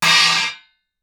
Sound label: chinese choke crash, Suspended Cymbals; Percussion